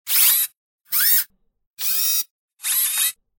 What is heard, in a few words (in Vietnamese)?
tieng chuyen dong cua canh tay co robot quay